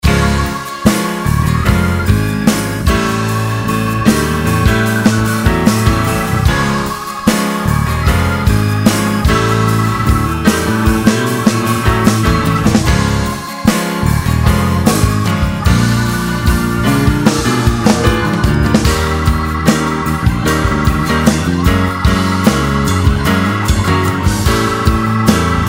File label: Ringtone Instrumental